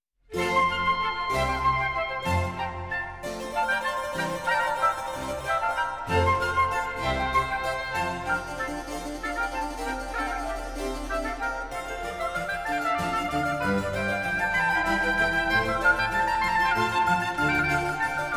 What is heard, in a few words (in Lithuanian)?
Koncertas F-dur; Tiltas